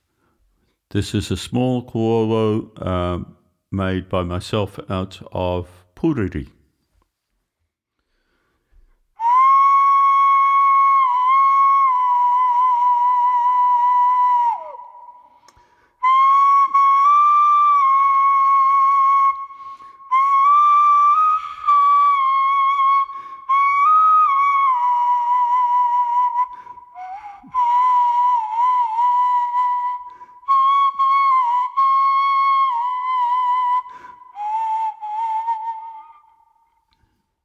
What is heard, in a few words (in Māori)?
Koauau Puriri